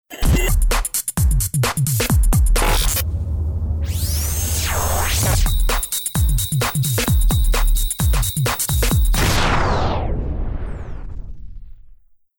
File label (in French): Base rythmé